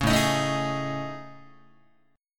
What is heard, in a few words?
A Major 7th Flat 5th, down Guitar; AM7b5 strummed; JGuitar